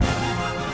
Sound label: Music Fury Theme Bpm160 Start; asterix obelix slap them all 2